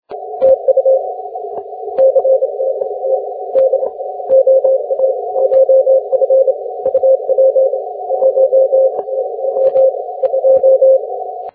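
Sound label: 17m Morse code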